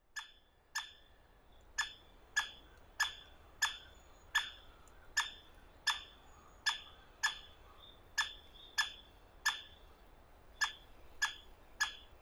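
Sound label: White-backed Woodpecker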